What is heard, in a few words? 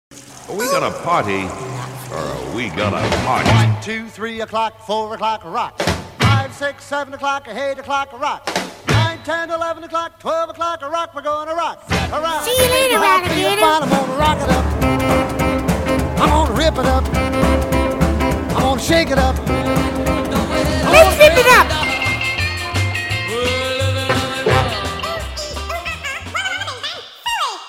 rock n roll